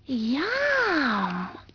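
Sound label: female yum; People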